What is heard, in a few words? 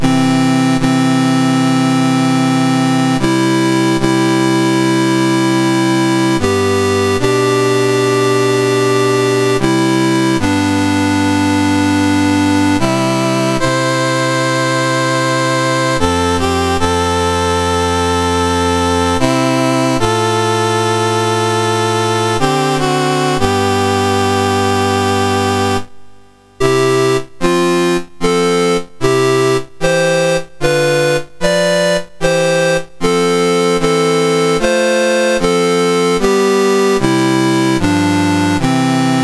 Taiwan, National Anthems, MOS 6581; MOS6581R2